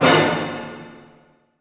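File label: A diminished fortissimo, orchestra hits; Sound On Sound - Peter Siedleczek's Orchestra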